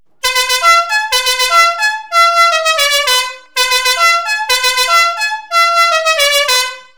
fiamm m4 la cucaracha novelty penumatic music horn trumpet bocina car van